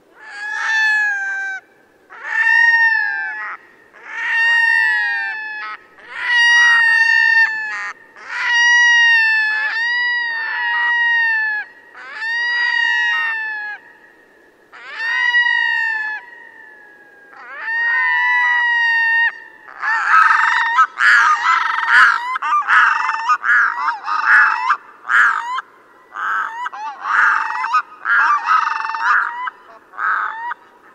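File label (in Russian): gagara, loon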